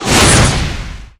near miss; tf; dragons fury

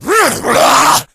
rage, infected, male

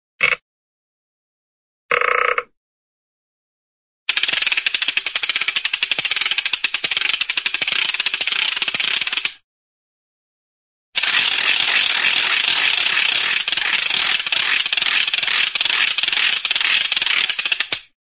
ratchet, cartoon short ratchet movement

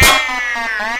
sentry damage, weapons; tf

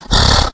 hulk breathe; Left 4 Dead; Source Engine; tank

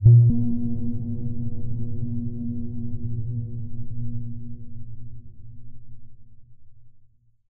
editor blips; Source Engine; Portal 2

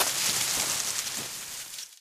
foliage, footsteps, survivor, run; player; source engine; left 4 dead 2

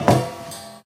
metal grate, footsteps

